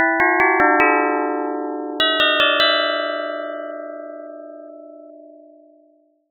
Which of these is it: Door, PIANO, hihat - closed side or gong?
gong